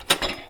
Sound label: fooley